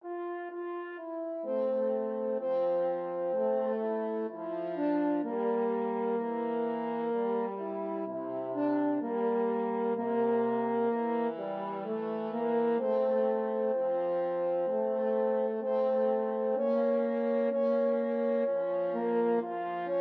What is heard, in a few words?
o sole mio, french horn duet